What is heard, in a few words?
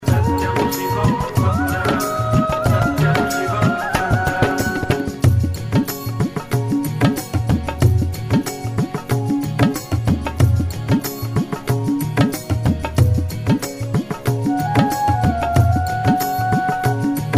Karaoke Song